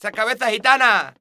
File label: Flamenco, Vocals, Male